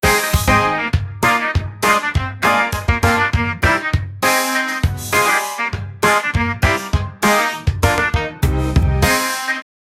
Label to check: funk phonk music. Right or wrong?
Right